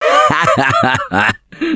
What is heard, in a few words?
Laughter, Survivor Voice; Gambler; russian